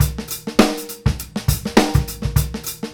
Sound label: groove, Grooves 99-132bpm